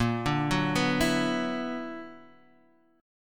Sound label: B-Minor Major 11th